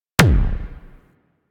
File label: Arch Gun Primary Beam Punch; Warframe; Archwing; Hildryn